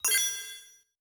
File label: Menu Weapon Assemble; Custom UI Sounds; Call of Duty Advanced Warfare